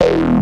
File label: Melody Shot E, dubstep; One-Shots; Melodies; Glitter; Ghosthack; Dubstep Sounds 2025